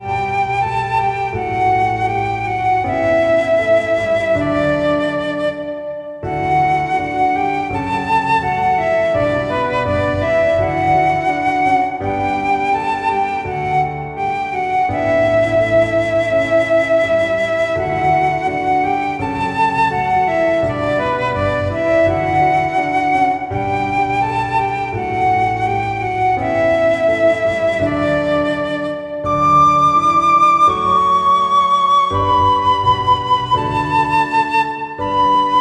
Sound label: Hymn piano flute